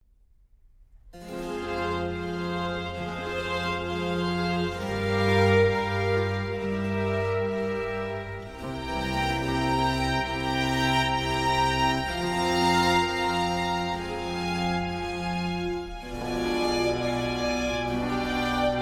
Concerto For Strings And Continuo In A Major, Adagio; Vivaldi; The English Concert